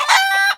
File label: chicken death, creatures; Counter Strike Model Pack; CS:GO